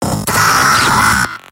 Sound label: Demoman pain severe